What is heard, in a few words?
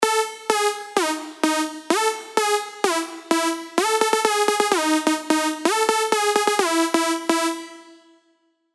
Sylenth1 dirty dutch leads; Electric Girl; revealed sylenth1 dirty dutch leads vol 1